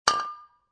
Foley, Aluminum Spray Can Being Placed Down On Concrete; Set Down